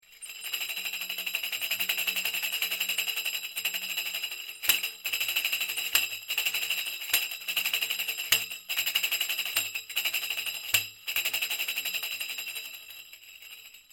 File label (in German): tambourin